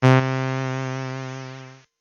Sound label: Violin